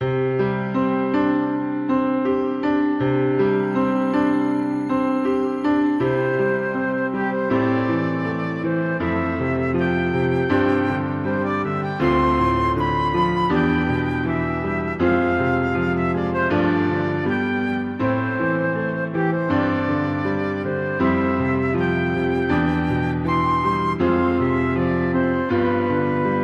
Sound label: Nice Piano